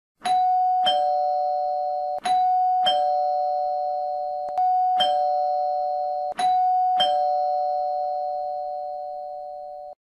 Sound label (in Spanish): timbre de casa